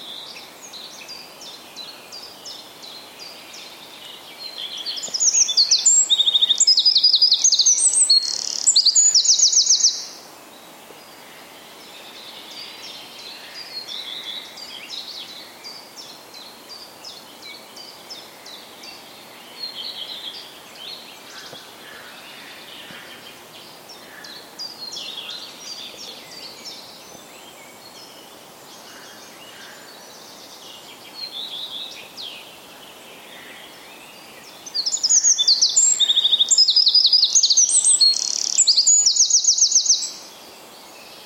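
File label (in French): troglodyte rougequeue front blanc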